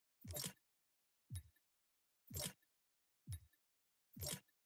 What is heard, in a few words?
mouse button click ringtone; fortnite